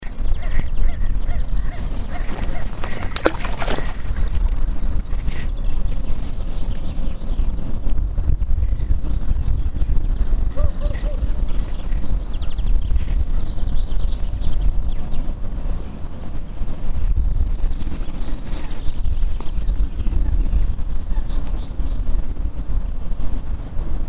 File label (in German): seegeraeusche